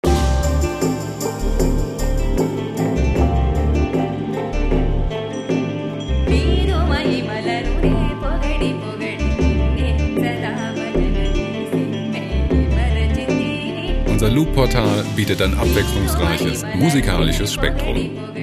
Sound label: hindi